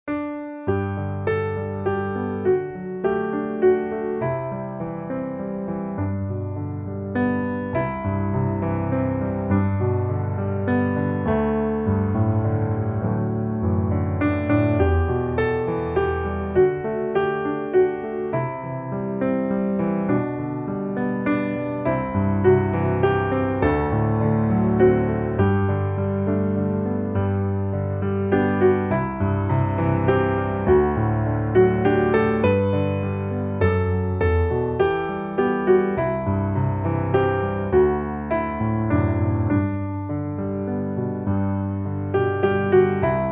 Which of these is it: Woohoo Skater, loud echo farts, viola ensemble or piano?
piano